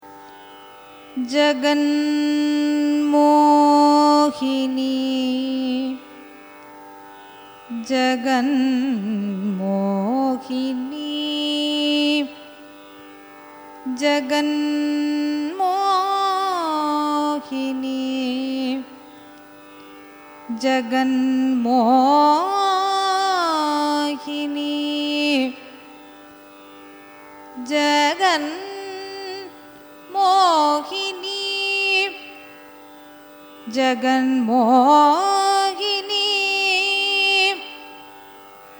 raga jaganmohini signature; carnatic music

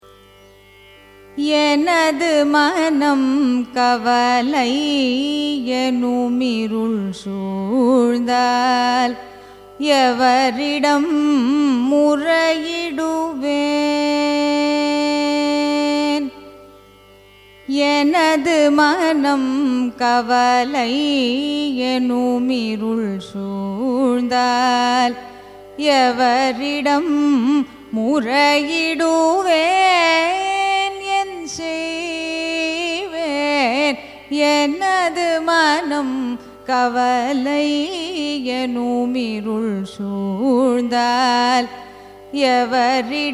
raga hari kambhoji carnatic

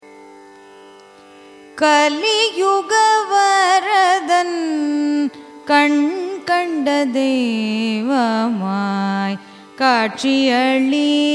raga brindavana saranga carnatic

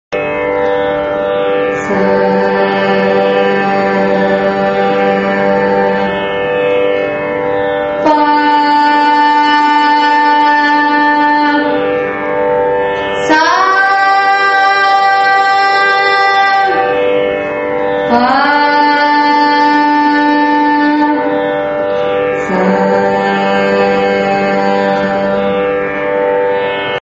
kattai shruthi, carnatic music

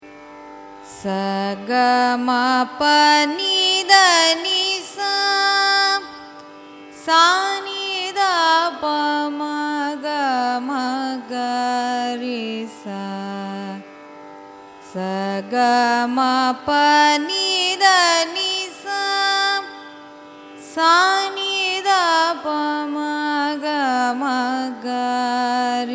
raga behag arohanam avarohanam, carnatic music

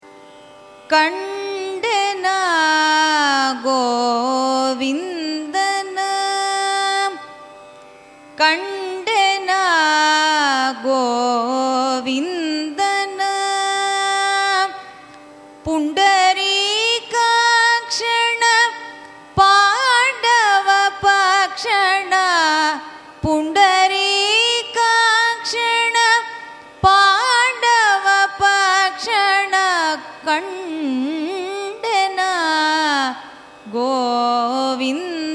raga chandrakauns carnatic; kandena

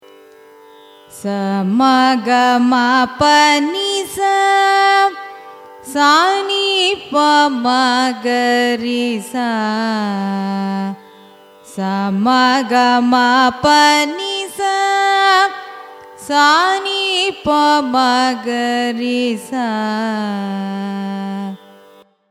raga kedaram arohanam avarohanam, carnatic music

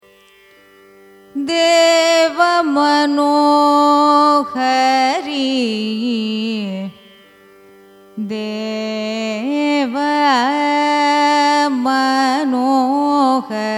raga deva manohari, carnatic music

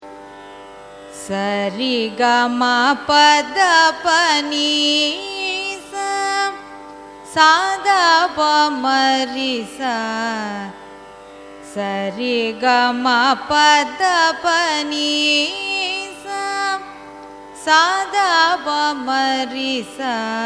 raga janaranjani arohanam avarohanam; carnatic music

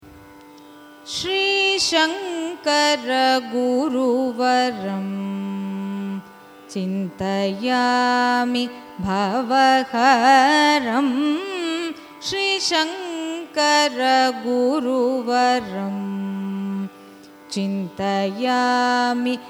raga nagaswarali carnatic; carnatic music

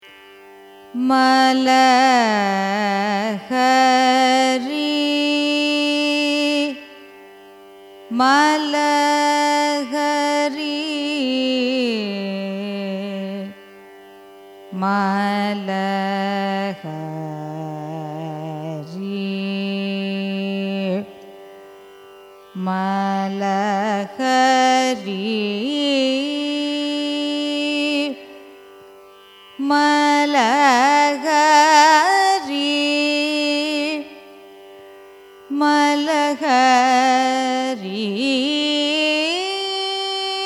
raga malahari signature; carnatic music

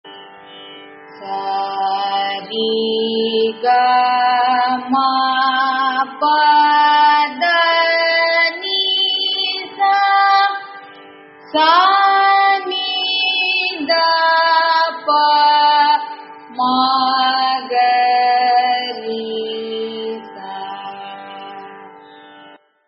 scale, carnatic music

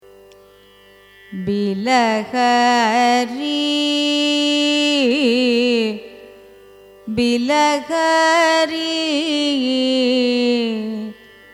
raga bilahari signature, carnatic music

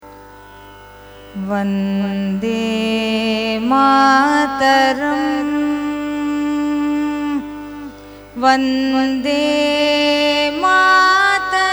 carnatic song vande mataram desh; bankim chandra chatterjee

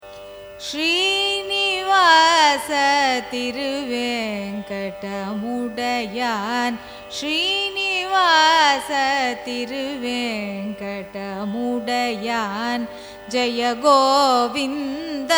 raga hamsanandi carnatic

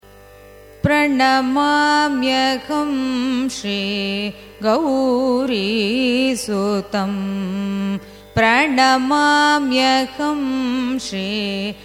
raga gowlai carnatic; pranamamyaham

